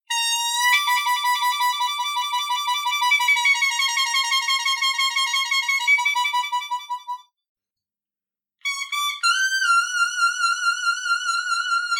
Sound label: 8B and 9B Trill, harp; 1st Position Blues Harp – An Introduction (Part 4)